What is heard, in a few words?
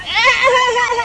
ehehe